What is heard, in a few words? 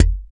slap bass